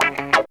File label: GTR TON; E-Lab; X Static Goldmine 2; akai